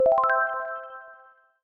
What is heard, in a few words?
Notification